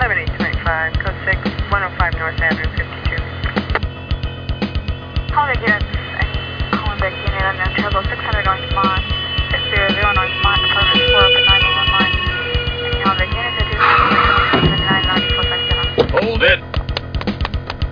cops; KPSWIN

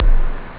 drums bass; OctaMED SoundStudio V1

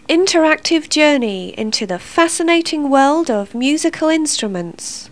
guitar

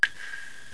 drop; CD-ROM Magazin 1996 September

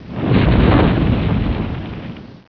firewall

